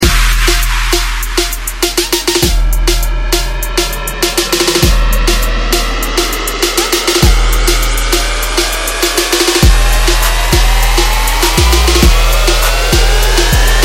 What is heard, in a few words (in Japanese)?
アラーム スパーク